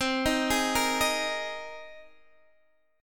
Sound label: C Minor 7th Sharp 5th C Guitar Open D